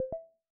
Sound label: generic notification